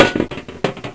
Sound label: grenade hit; dod 1.3; dod enhancement pack